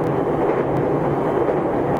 E231 mid bridge; tetsuon

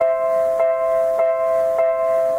crossing; tetsuon

